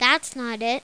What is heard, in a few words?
bummer